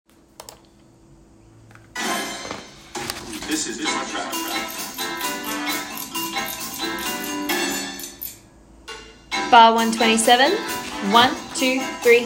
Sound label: Alto; FAME Holiday Pack; Auditions